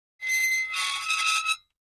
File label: Metal Scrape On Metal